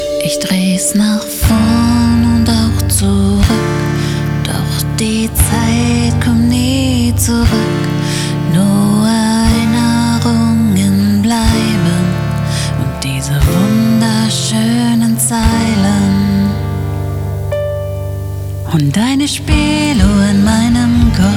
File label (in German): spieluhr